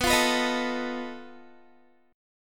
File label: B 7th Flat 5th B Guitar; B7b5; strummed